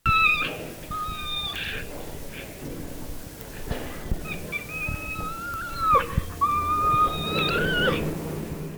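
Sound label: borneo orang utan, ボルネオオランウータン 鳴き声; おとなのオスは遠くまで響く声で、おたがいに存在を知らせあっています。; 多摩動物公園; オランウータンはボルネオとスマトラにくらしています。; オスどうしが出会うと、声をともなった攻撃行動が見られます。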